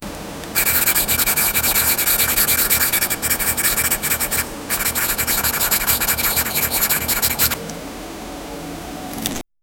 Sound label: swirling pen drawing